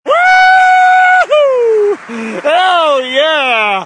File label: Wahooo